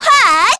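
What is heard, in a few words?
Vox Casting, Korean; Ophelia; kingsraid; voices heroes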